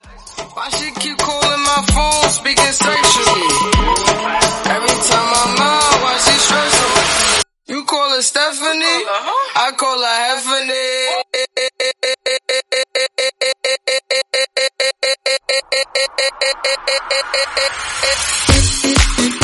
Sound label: Riddim 130bpm Bm